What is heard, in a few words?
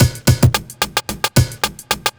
block rock kit